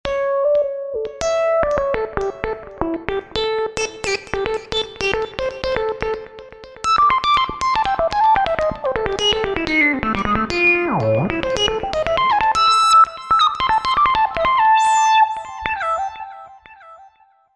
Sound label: JP-8000 Solo, Roland V-Synth; Factory Patches